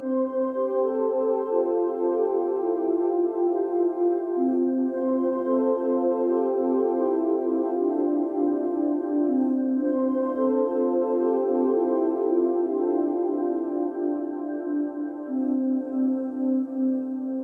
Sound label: Synth